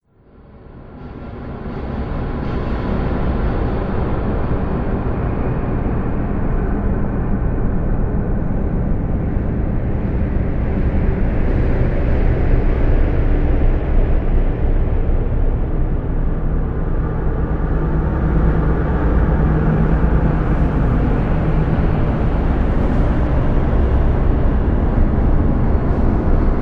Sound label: Scary Horror Drone; Sound Design; Drone Sound Effects